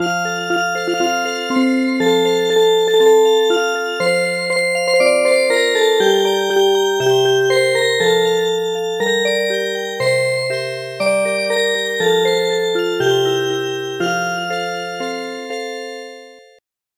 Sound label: Music Box; Live Long